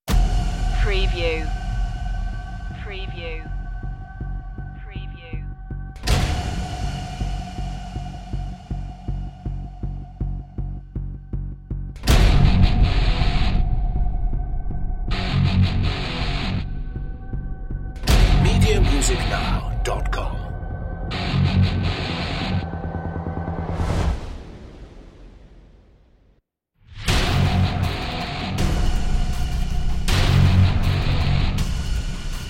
blind violence no hybrid

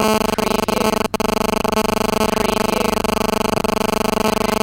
Electrical Noise

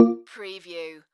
Multimedia Alert

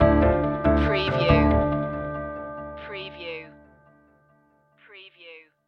mind matrix stinger

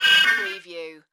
Digital Beep Static Warble

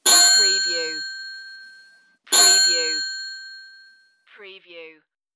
Bell Bicycle 2 Rings

